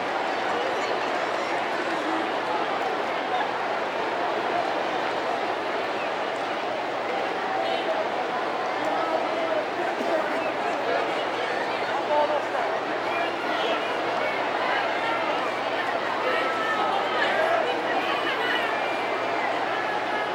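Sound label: baseball crowd ambience loop; Crowds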